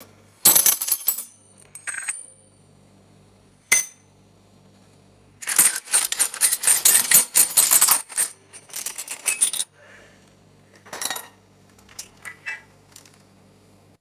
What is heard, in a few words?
metal cutlery dropping and clinking